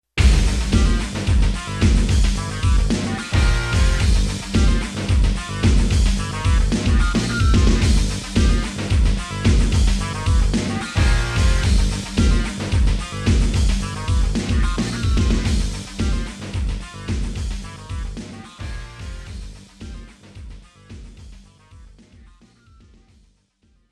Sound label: KO MUSIC; Buriki One World Grapple Tournament '99 in Tokyo